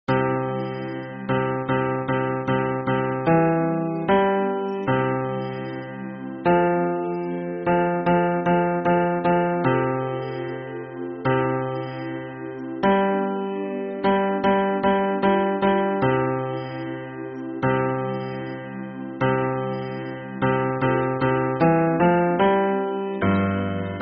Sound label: hymn bass